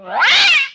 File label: leaf cat attack